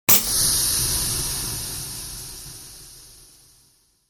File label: Steampunk machinery compressed air release hiss